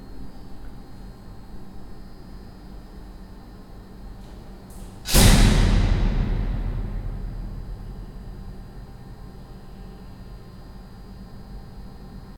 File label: Noise; ZKM